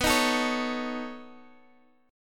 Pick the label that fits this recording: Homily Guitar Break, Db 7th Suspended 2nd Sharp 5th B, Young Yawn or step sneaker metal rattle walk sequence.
Db 7th Suspended 2nd Sharp 5th B